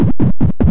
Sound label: knock